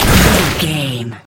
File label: water blast projectile spell